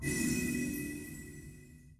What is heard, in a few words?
show Betline; asgardian stones